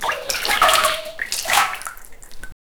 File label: splash water to the other, bucket